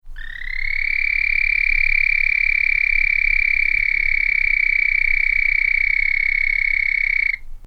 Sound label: bpunctatus short, frogs